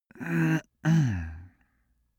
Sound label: cartoon male harrumph slow